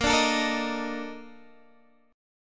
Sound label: Bb Major 11th Bb down Guitar Half Step Down